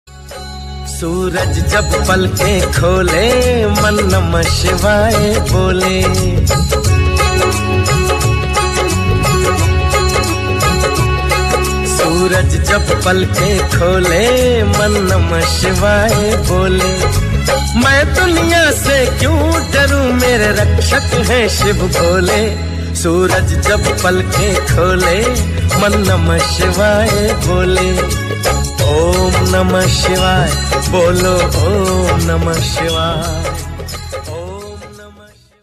God Ringtone

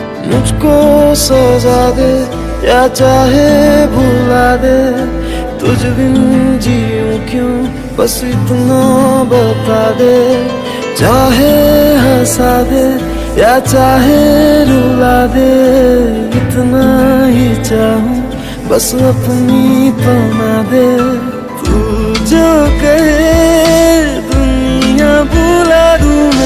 Sad Hindi Ringtone

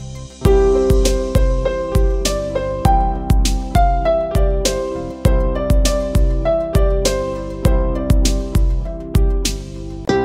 Instrumental Ringtone; Kesari

